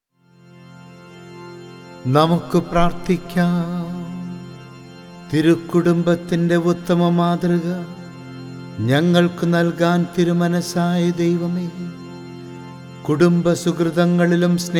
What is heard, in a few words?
Chant; Samithi Prarthana; ordo cantus